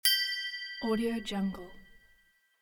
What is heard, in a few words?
chimes